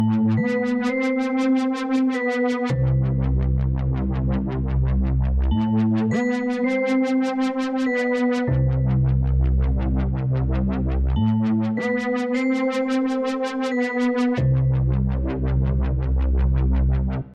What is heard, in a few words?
wobling